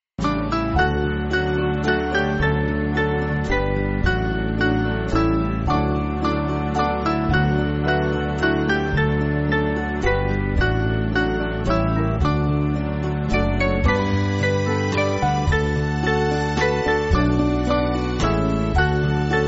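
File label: Band, Backing Files for Churches; When He Cometh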